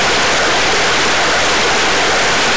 water run

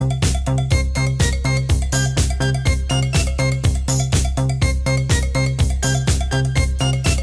aerobic; emotes

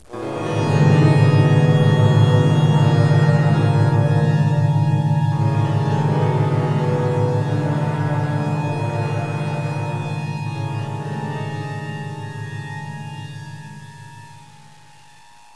Music Rescue Start; bog nomercy

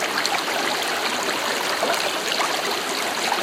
river

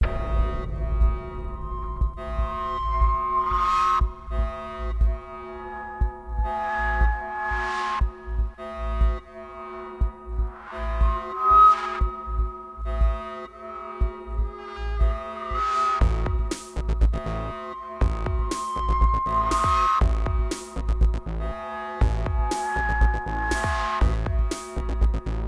armoury alarm; jb outerspace

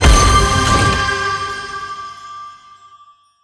success; cstrike; trading

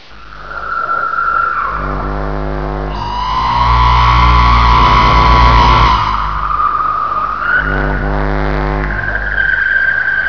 airport not identified; ze ls airport; cstrike

